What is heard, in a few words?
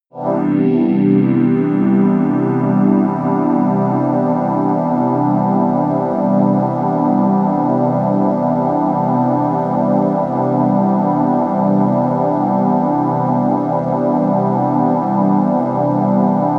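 morning air, pads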